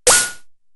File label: DF-500 Misc, Drumfire